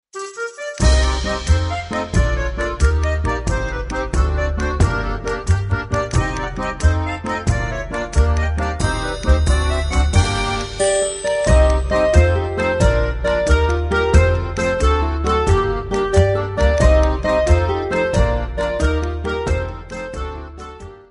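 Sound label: Tarantella, Blockflöte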